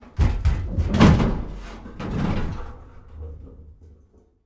xeno ceiling vent

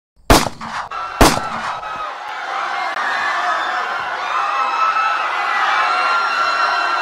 gunshot and crowd

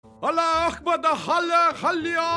xd haha

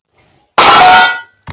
bang bang water

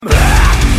blegh

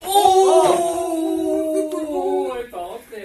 ouhhhhh

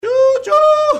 choo choo

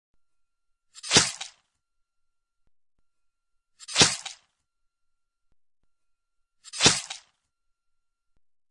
stabbing

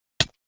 minecraft hurt

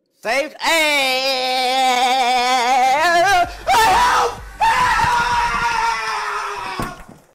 ahhhhhhh; tyler1; meme

